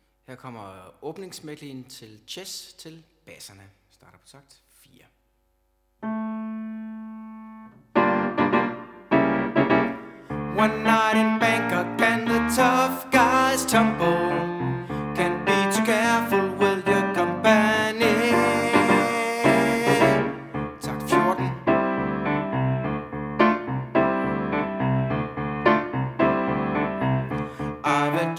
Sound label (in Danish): Bas